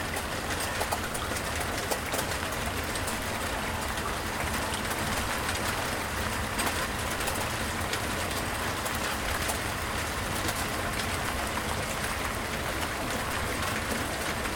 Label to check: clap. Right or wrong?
Wrong